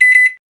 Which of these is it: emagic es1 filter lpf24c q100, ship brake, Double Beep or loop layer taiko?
Double Beep